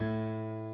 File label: key; keySounds